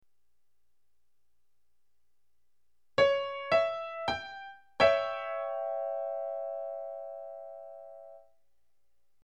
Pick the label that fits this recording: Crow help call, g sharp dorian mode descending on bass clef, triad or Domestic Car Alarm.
triad